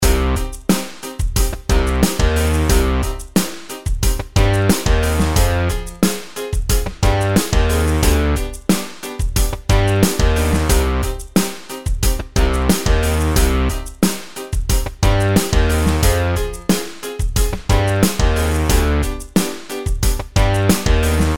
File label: Catchy Pop Riff